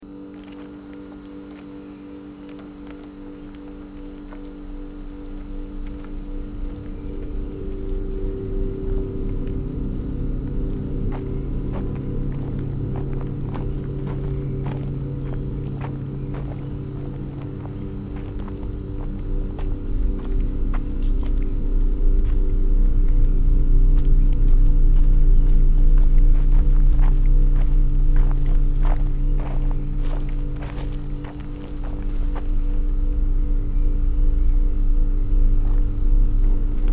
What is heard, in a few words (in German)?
soundscape chill zweisam; dragan maon soundscape chill zweisam by karrakula